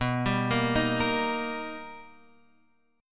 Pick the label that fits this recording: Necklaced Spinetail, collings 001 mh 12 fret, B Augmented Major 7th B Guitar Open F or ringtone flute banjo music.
B Augmented Major 7th B Guitar Open F